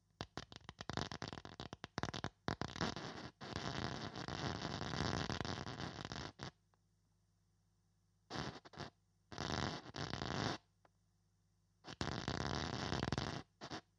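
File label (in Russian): звука ножа с помощью проигрывателя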